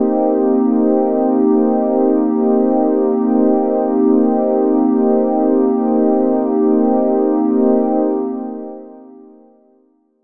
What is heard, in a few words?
pad transcendent; Pads